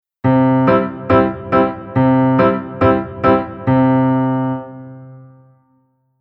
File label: Piano Dur